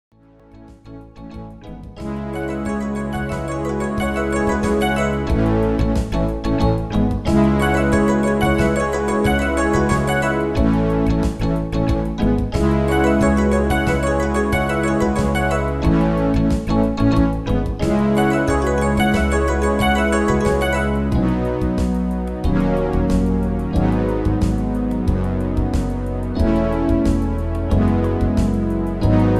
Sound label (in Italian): Africa tastiera, Kurzweil PC 3